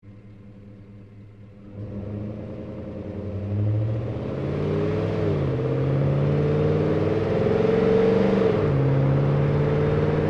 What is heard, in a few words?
Truck GMC Savana Onboard Drive Corners Fast; 2010 GMC Savana; Trucks; Big Room Sound